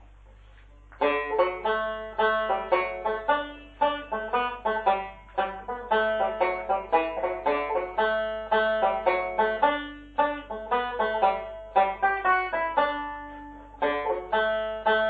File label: Waltz Mazurka (D); Sonny Brogan